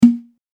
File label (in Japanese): 卒業証書入れポンッ